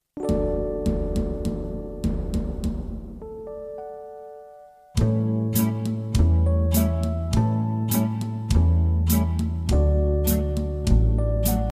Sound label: distant drums; country